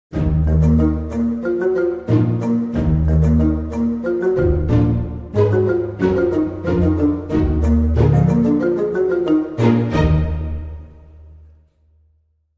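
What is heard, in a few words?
Dramedy Sting, No Percussion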